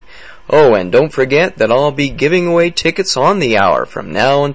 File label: Good Old Blues